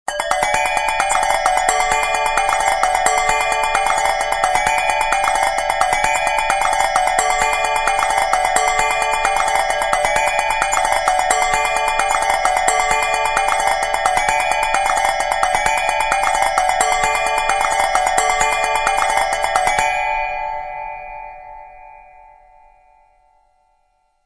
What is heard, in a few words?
ringtone; new flurry